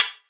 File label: cymbal; osu